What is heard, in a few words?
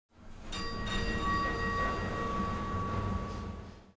steamboat distant; Soldier Of Fortune II Double Helix; XBOX; ambience